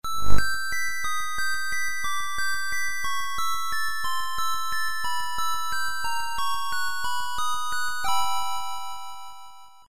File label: continue; GhoulsNGhosts